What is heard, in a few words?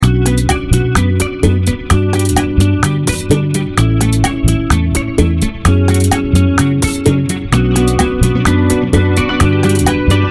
latin vibes bachata